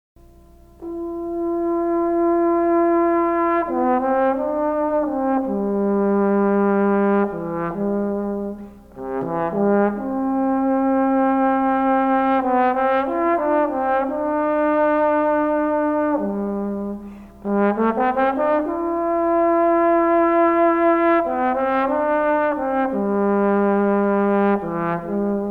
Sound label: trombone